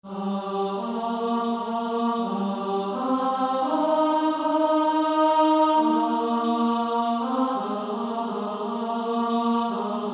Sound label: 3A